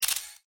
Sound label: Capture Sound; KShare